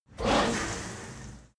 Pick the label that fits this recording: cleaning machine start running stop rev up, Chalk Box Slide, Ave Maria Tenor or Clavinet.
cleaning machine start running stop rev up